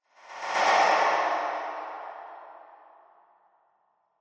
Resonant Drop, sci fi sound effect; Movie Sound Effect; Sci Fi Sound Effects; Andrew Fleming

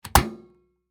Breaker Switch On, 400, Amp; Machinery; Blast Wave

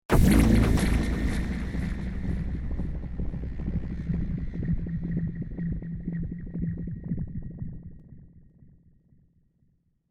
Liquid Pump, Thick liquid machine pump burst.; Tech (Sound Effects:Science Fiction)

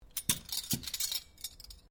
cutlery general place cloth, Household cutlery; Kitchen

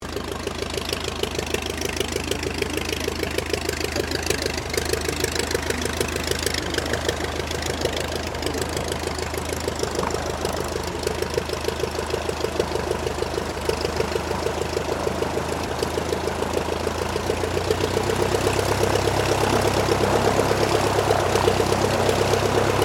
AIRCRAFT DH82A DEHAVILAND TIGERMOTH TAXI SHUTDOWN; 1936; Airplanes; Vehicles

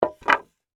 Wood Plank Down, Set, Down, 2X6; Wood (Sound Effects:Materials); BlastWave

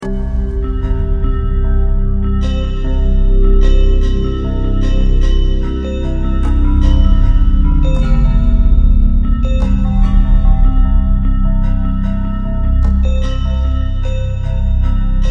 Synth, Stab, Drone, Horror, 5.1; Chimes, Creepy, Musical

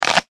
Cartoon Bite Chomp; Cartoon Voices; Cartoons

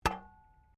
SHOVEL SOUND, Working with a shovel or spade; Scrapes; Objects; Foundation4

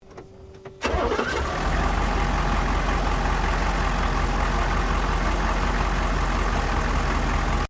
4WD HUMVEE IGNITION, US Airforce model Humvee; Truck; Trucks; Vehicles

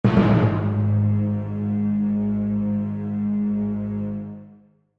Hit Orchestral, Hit Orchestral Low Dark; Musical; Blastwave

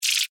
Insect Voice Chirp, Vocalization, Bug; Animal

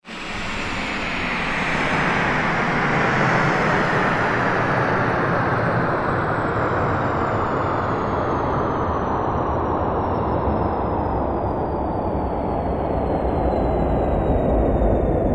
Science Fiction Spaceship Land Descend Long; Spacecrafts

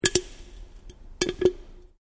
Can Aluminium Inside Bend; various metal cans; Metal (Sound Effects:Materials)

